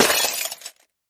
Glass Box Crash

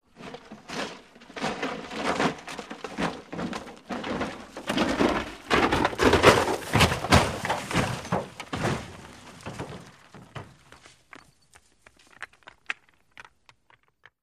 Vehicle Falling Dow

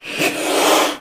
Cobra, Indian Hiss. Close Perspective.; snakes

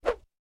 Small Swishes Light

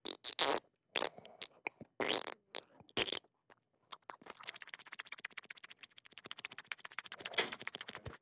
Gross Mouth Mvmt, chewing & eating

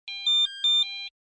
pager alarm, beeps

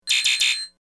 Computer Warning Bee, beeps & tones

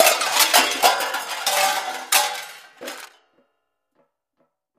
Junk Thrown Junk Pile, junk crashes; Junk Thrown Onto Junk Pile

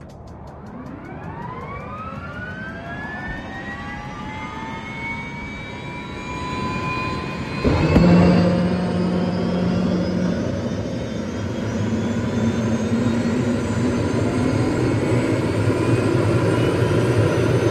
OH6 Loach Interior, little bird

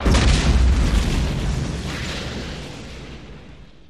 Fire Explo Rocking

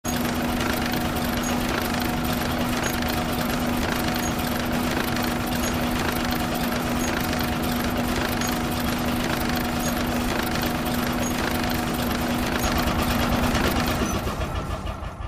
Robot Device Hum runs, factory

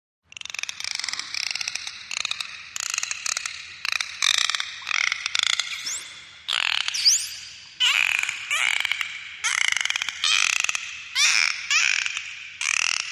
Dolphins Vocalizing

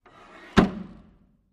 Wood Seat Sliding; seats & stools

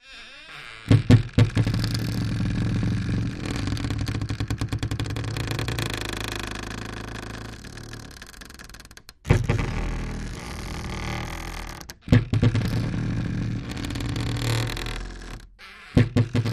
Door Wardrobe Creak Heavy; hinges & creaks; Door Wardrobe Creak Heavy x4

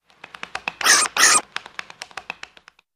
Single Bat Flying Sq

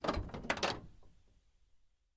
Camaro Door Open

